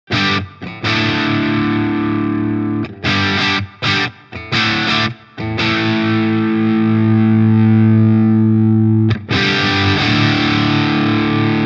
DS-1 Dist. 12h Tone 10h

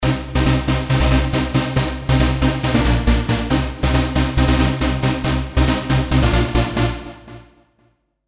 Super Saw Trance

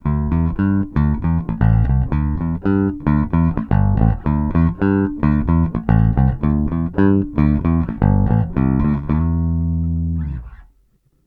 Middle bridge series

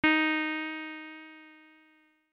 Bell part

